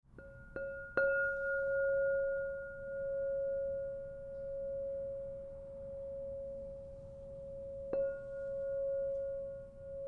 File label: Crystal Tones Singing Bowls